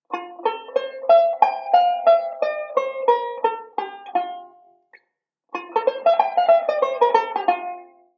Modal Sequence, banjo

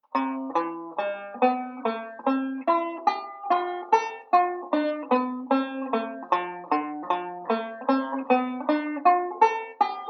Arpeggio Pattern; Beyond Chord Melody Volume II

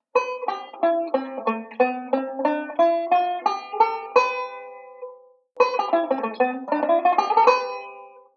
Modal Sequence, banjo

